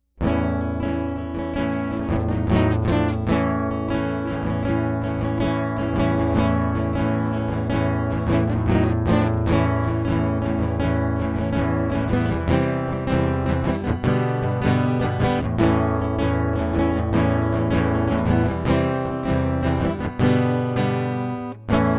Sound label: acoustic